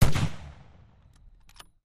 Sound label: SNIPER RIFLE, shots